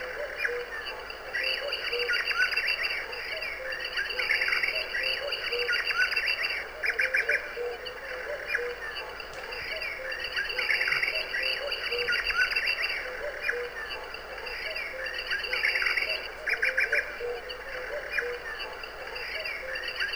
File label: birds, tinnitus maskers